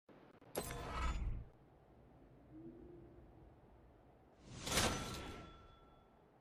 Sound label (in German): quest erfuellt; borderlands 2